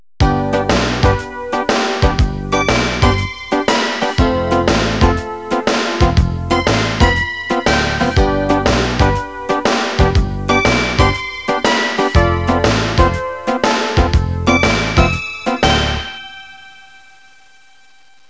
suonerie businessy

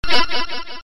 suoneria botton; beep

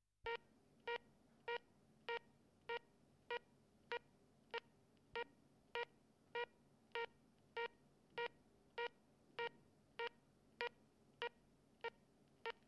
TELEFONO OCCUPATO; phone

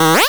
suoneria botton; beep

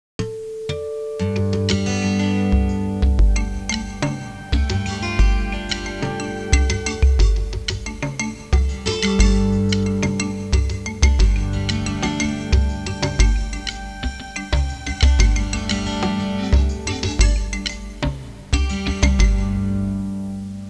suonerie musica hawaiian

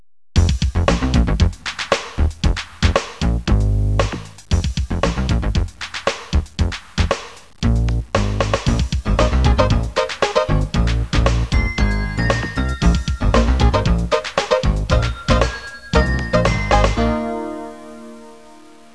suonerie businessy

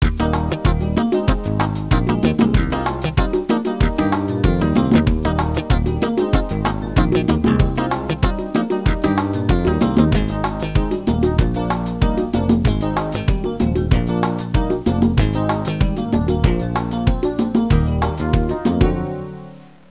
suonerie contemporanea; musica contemporary

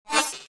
suoneria botton; beep

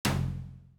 suoneria botton; beep

